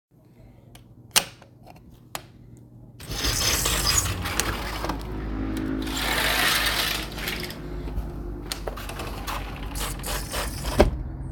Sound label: Opening door to outside